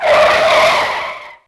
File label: Tire; Yamaha DTXpress; Effect 1